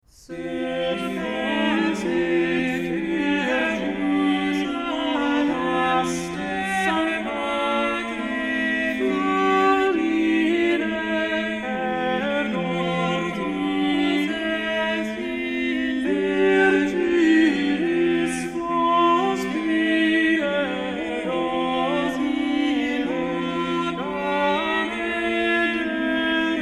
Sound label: motets on tenor; Dominus super te sed fulsit primus tenor